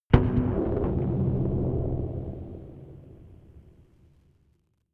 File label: gun pop gun pop; schusswaffen